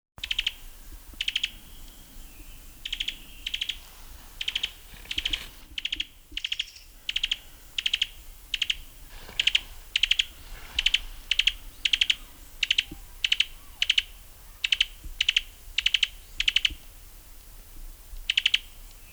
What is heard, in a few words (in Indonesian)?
suara ngebren cuban tody